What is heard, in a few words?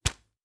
Arrow Shot; Survival Game Sounds - Lite Edition; Combat and Hunting